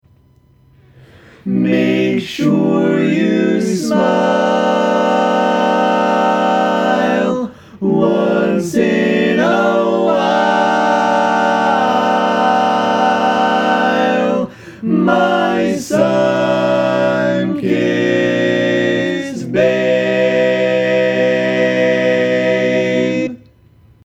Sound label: All Parts, barbershop